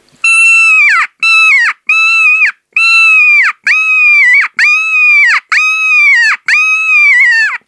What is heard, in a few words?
KOD High, fox caller; T2 Black; 3D printed fox caller